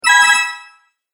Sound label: Collect Bonus, video game sound effects